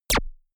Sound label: gun shot silencer synthesized zap; schusswaffen